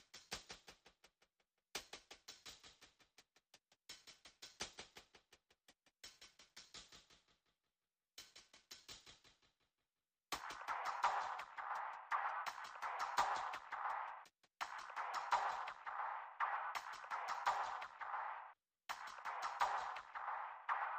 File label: Perc Only